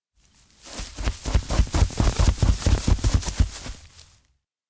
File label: steps charge something; anemoi